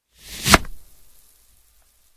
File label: cut; minigame